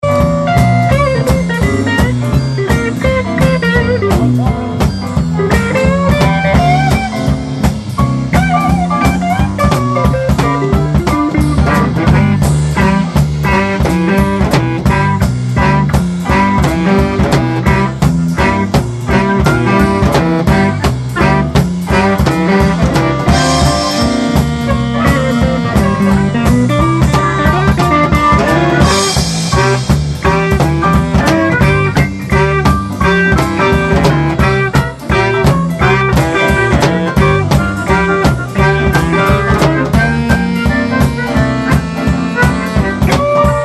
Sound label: Blues quartet